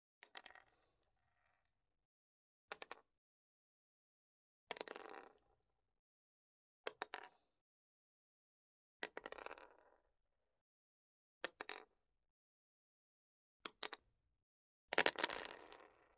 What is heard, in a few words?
Bullet Drops To Brick, foley gun movement